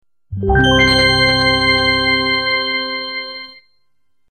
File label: sound effect message